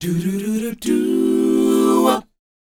DOWOP D; ILIO; Vocal Planet

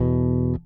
Fifth One Shot EB, Bass; Bass X

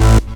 saw lead synth

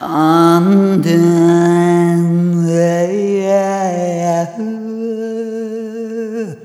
Arrhythmic F; Sonic Foundry (Sony Creative Software); ILONA

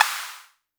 vermona drm1 mk3 hh

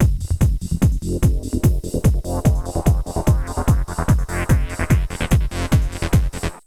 Essential beats

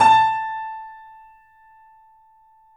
The Piano; InVision Interactive - The Piano

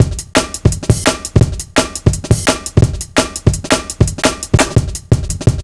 170, Drum Loops, Drum & Bass

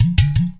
UDU; Spectrasonics; Heart of Africa

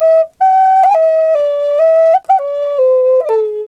didgeridoo riffs; Big Fish Audio - Didgeridoo and Other Primitive Instruments; FLU RIFFS 1

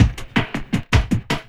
LOOP, 160 BPM, Drum & Bass

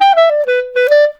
Clarinet

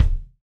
Hip-Hop Kit Bd; Roland Juno-D

